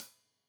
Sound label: Hat Loose, Hi-Hat, Cymbals Stereo